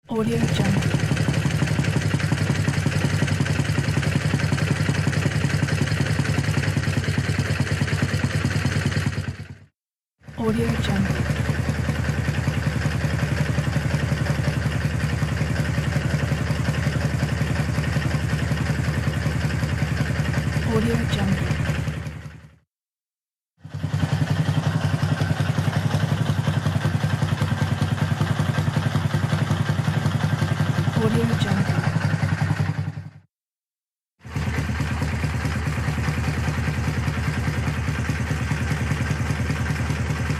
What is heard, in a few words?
motorcycle engine idle